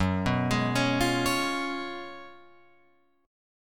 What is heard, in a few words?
F sharp Major 7th Suspended 2nd Suspended 4th, Guitar, Open F; F#M7sus2sus4 arpeggiated